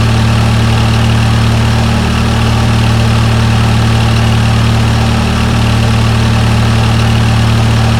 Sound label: truck engine; DCS